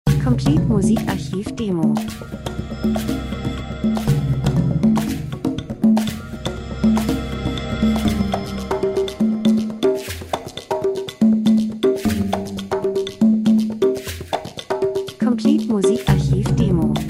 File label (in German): drums, rhythmus; Traditioneller Rhythmus fremdartig Ferne Länder Kulturen Menschen Zeitgeschehen Reise Natur Dokumentation; Stimmung